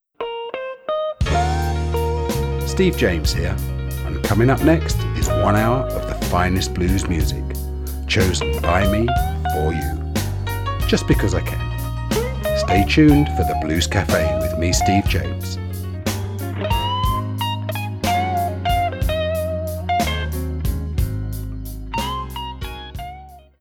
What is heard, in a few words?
Wet